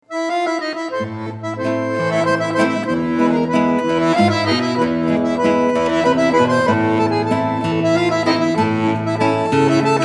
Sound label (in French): Valse sudamericaine